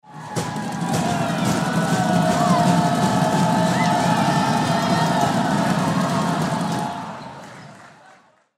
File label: Crowd Arena Stomp Drumming Swell; Crowds (Sound Effects:Backgrounds); SPORTS